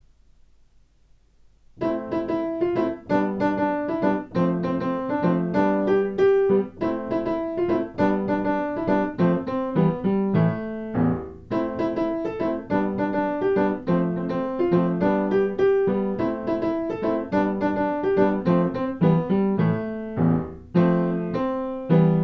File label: funky; piano; gabby